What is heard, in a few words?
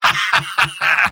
laugh short; Soldier